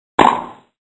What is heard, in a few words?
tennis ball